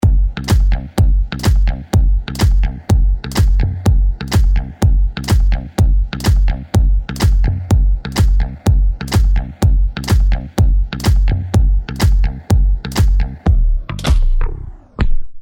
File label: start action, music; hotlinemiami